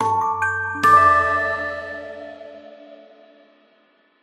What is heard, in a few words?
Secret, music